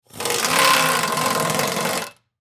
Air Wrench Loosen, Air Wrench Undo Flywheel Pneumatic Loosen; Power Tool; Power Tools; Industry; Blastwave